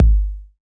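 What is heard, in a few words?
808 kick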